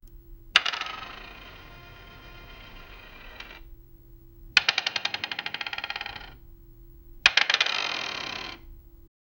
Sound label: billboards turning on, startup